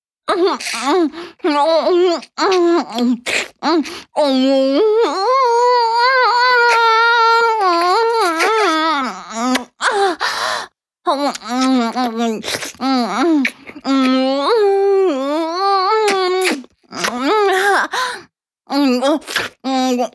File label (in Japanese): お掃除フェラ(中):陰茎を根元まで咥えて中に残った精液を搾り出す, チュパ音, ASMR; チュパ音抜粋集